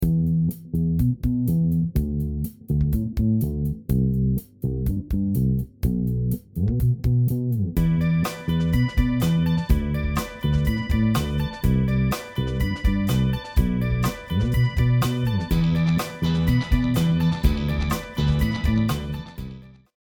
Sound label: Random Tune